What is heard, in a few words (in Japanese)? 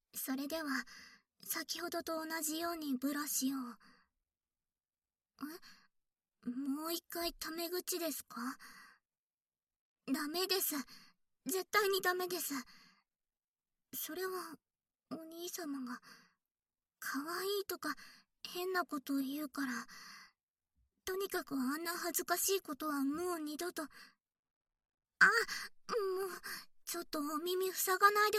【右】耳ブラシ